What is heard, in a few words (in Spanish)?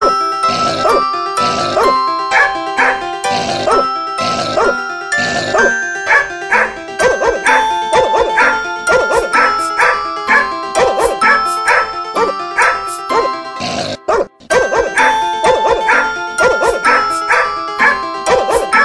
perros cantando remix